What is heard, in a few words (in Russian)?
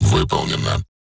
ПОИСК ЗАВЕРШЕН; Crysis2